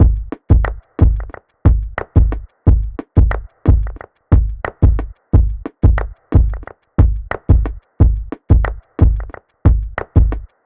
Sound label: Grunge Bounce Drum Loop